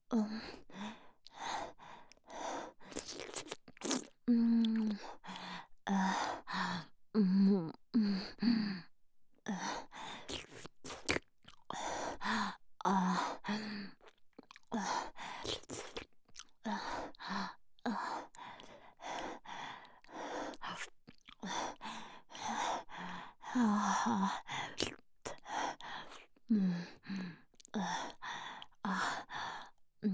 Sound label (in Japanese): フェラ